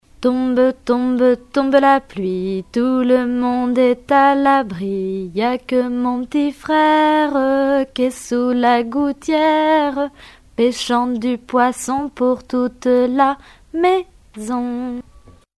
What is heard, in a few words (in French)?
Tombe tombe tombe la pluie comptine